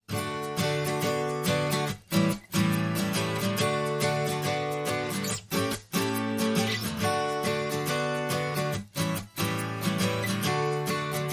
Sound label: Acoustic Guitars